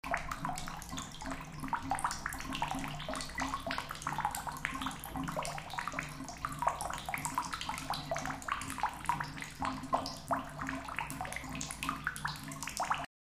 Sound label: water rippling drain; casa